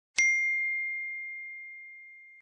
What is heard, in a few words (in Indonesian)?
nada pesan iphone bell